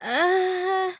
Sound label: uhhh; tsumugi